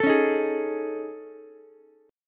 A Major 7th Flat 5th, Ukulele; AM7b5 strummed; JGuitar

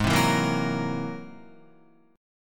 G sharp Augmented 9th, Guitar; Listen to G#+9 strummed

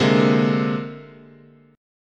C 7th Suspended 2nd Sharp 5th, chord

